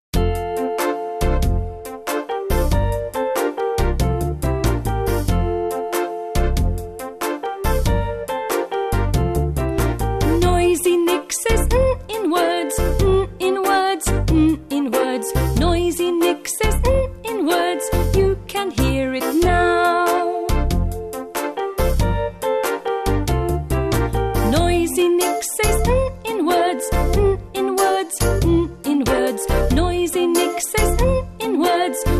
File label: ALPHABET SONGS; NOISY NICK